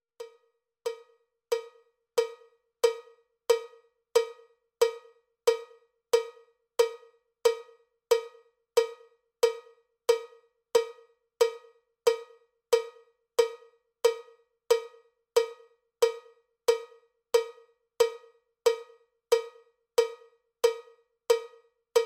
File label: cowbell click track bpm 79; cowbell click track bpm 91